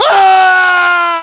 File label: death fall; english; voiceovers; falls